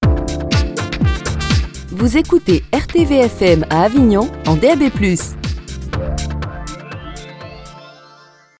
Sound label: Jingle; Avignon V1